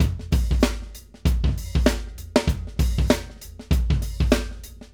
Acoustic Live Drum

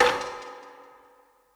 Rim Canyon Rattle; Lost Tapes From Mars; Clap & Rim

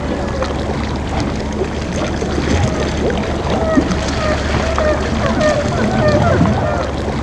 low harbour, sub aqueous; Zero-G Ambient 1

